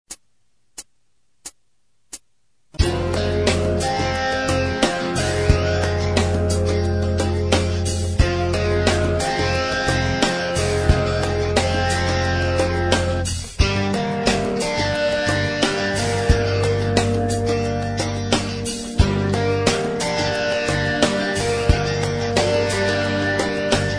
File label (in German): aeolisch, guitar